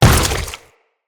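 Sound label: footstep on goo, enemies; Footsteps; Grineer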